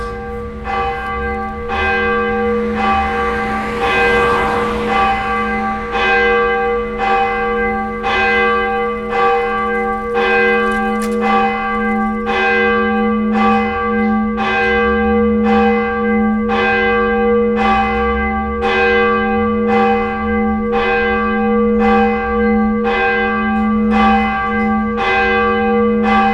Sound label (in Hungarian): misére harangozás; Kassák Lajos utcai